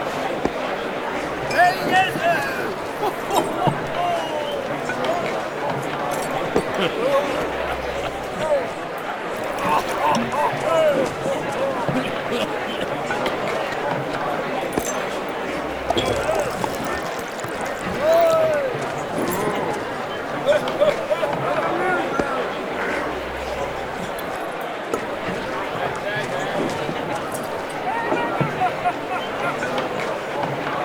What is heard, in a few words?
inn loop; Blind Gladiator